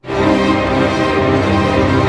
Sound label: ARP C, STR ARP MIN; Sound On Sound - Peter Siedleczek's Orchestra